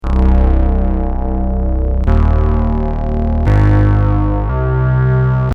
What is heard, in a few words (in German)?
lied lead